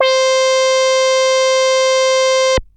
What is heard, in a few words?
Techno leads, hits and riffs